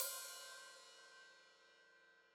Hat Open, Hi-Hat; Deeply Sampled Drums

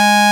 P6 SAW; INIS; Vintage

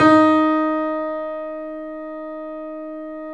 3D Pianos Yamaha C7; E-MU Producer Series Vol. 5

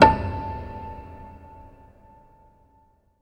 PIANO A5; Best Service; Advanced Media Trax Modern Composer; PNO HAMON

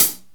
HAT

